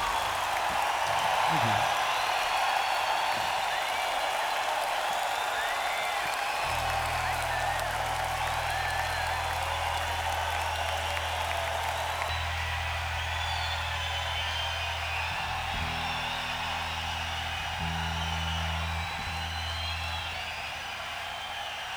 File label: Tuning